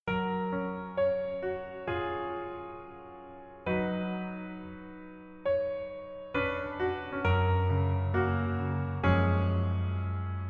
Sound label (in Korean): Piano ver, -1키